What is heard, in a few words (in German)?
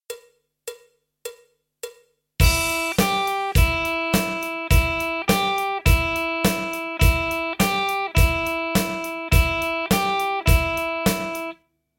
Blues-Rock Lick, E-Gitarren